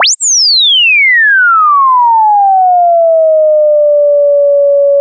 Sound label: long throw; soundeffect